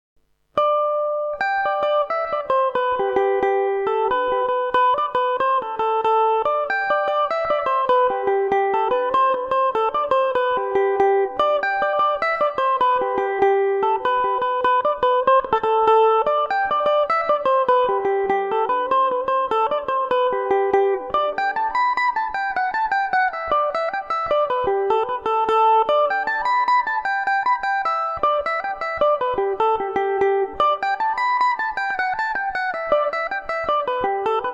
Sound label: groves of sweet myrtle gmaj, mandolin; The Groves Of Sweet Myrtle; Revelation Jaguar electric mandolin